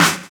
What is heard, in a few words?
Snare, Drum and Bass